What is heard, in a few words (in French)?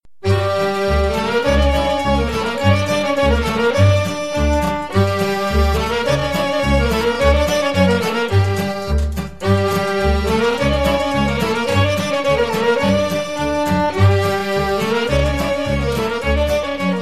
Arcanul Batrinesc, Roumanie